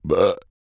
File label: burp; OPENAIR; Anechoic